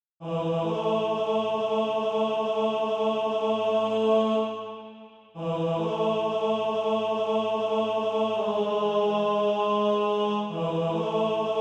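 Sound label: tenor, Practise Music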